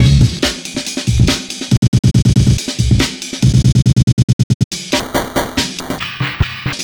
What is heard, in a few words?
Amen Breaker 140Bpm; vst fx bundle